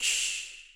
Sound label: cymbal, Roland MC-909